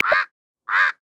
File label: Pied Crow; Xiaomi Redmi-Note-8-Pro; Africa